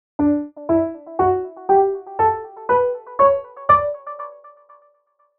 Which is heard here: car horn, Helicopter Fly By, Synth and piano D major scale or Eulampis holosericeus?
Synth and piano D major scale